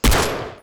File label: Deagle